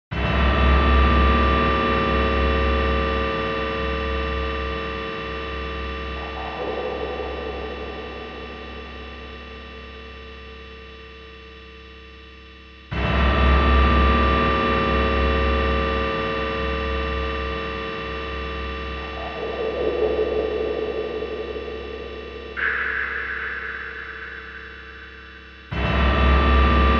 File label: Dark Footsteps; Corpse Party Blood Covered; Sound Collection Vol.2